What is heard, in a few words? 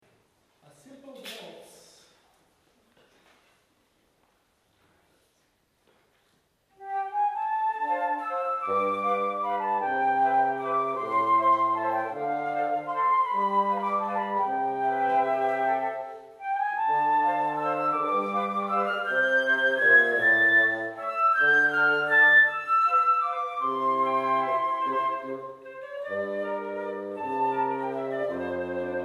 Simple Waltz